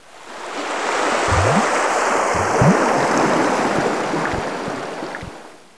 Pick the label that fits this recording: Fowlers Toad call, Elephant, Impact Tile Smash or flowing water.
flowing water